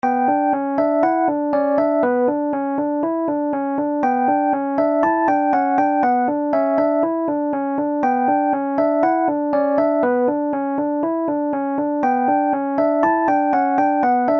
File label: Piano Test